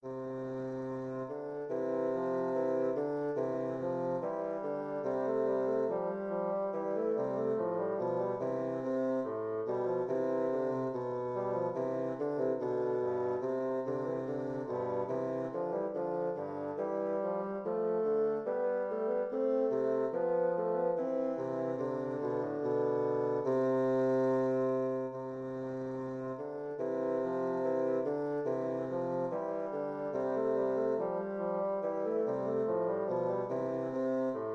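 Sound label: bassoon canon; mozart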